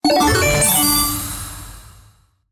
bonus reveal

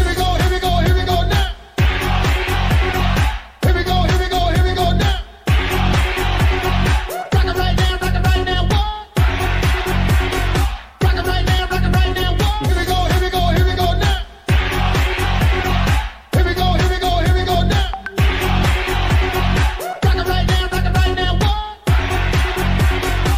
music

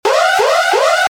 alert, pomodoro

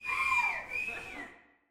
crowd Reaction brothel small Reveal; spellbound

